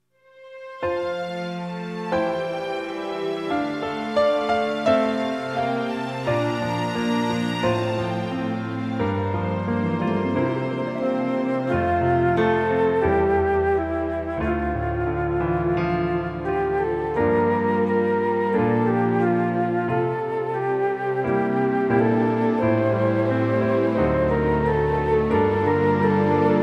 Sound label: instrumental